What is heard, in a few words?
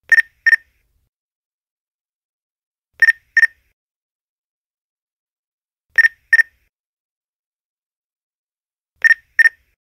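APX Low Battery, radio sounds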